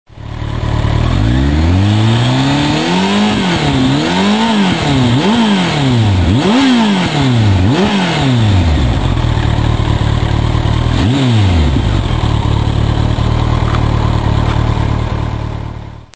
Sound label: zx14r; bike